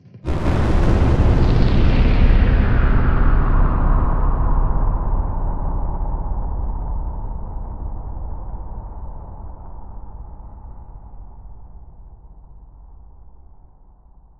cables blast ls; Half Life Alyx; music; vault

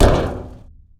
land metal vent, footsteps; player

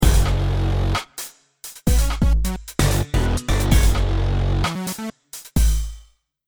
deathcam

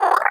vox idle, creatures, snark

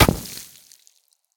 snow impact bullet; physics

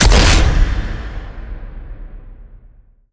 waste pit bridge lock

